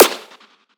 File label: FX Snare; Antidote Advent; Infected Track Drums